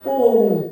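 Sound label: OHH, SOLO FEMALE; Spectrasonics; Heart of Africa 2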